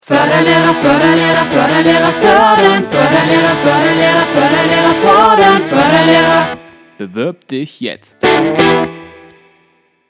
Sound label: Jingle